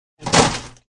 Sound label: Ice Machine Dig, Digging in an ice machine; Human